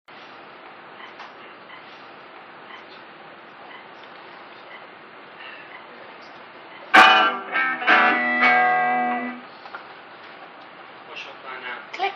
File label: clicking live